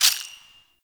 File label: Charge Full, Weapons; Prime Derelict Cernos